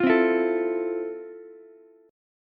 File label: F Major 7th F, Ukulele; strummed; JGuitar